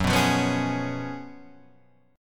F sharp Major 7th Suspended 2nd Suspended 4th F sharp, Guitar; 3,3,4,5,3,5; Half Step Down